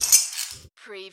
Button Sound Metal Wrench Move

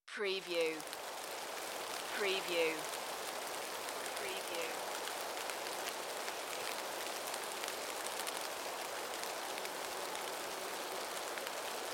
Beddgelert Forest - Heavy rain

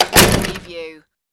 old door close

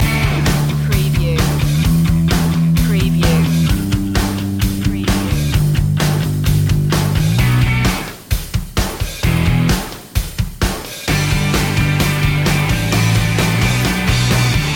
leading the way loop

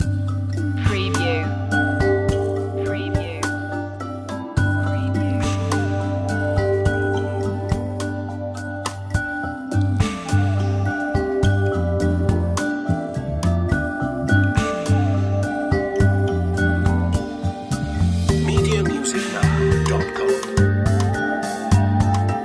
safari lite loop

